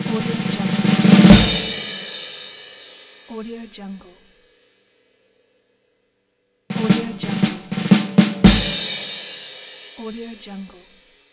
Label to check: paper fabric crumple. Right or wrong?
Wrong